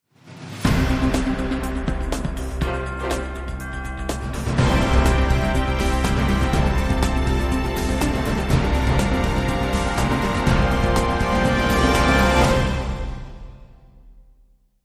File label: jingle; mapamond